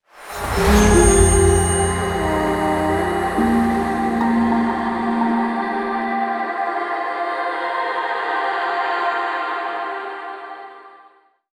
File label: skill sharp stand spell